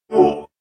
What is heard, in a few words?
hurt autotune; minecraft